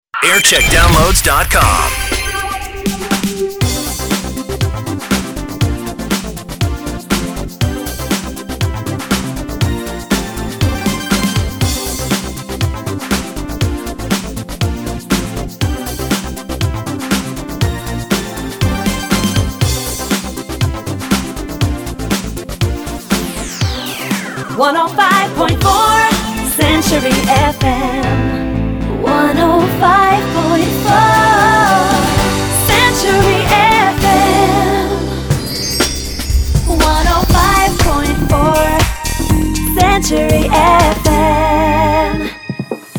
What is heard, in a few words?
jingle montage; century fm